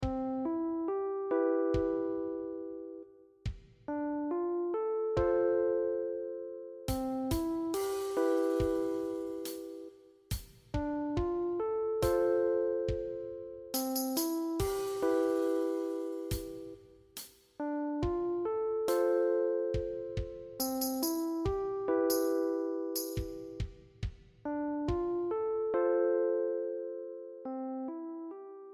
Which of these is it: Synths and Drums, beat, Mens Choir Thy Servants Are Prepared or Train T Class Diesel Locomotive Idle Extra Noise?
Synths and Drums